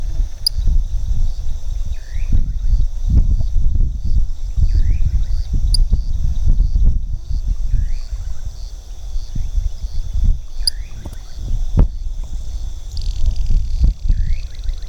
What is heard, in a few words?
Eleothreptus anomalus